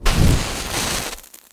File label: Gumbo Fire; The Princess and the Frog; wii windows; Hub Diner